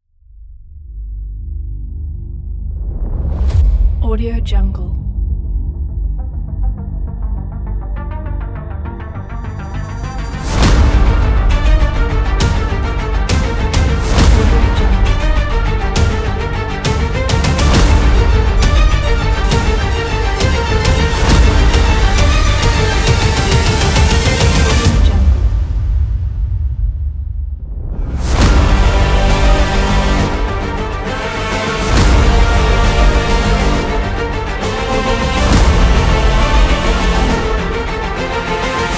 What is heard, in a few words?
trailer action; fly-productions